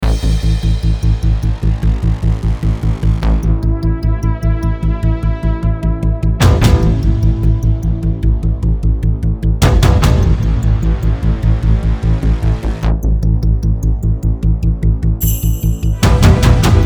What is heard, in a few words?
80s angry montage retro synthwave